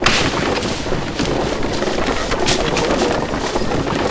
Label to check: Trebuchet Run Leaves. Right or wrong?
Right